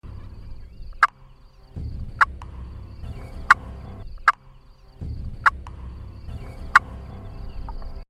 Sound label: Cluck